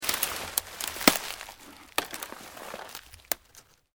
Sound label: Vine Dead Move, Dragging; Material components for constructing more complex sounds; Dragging (Sound Effects:Objects)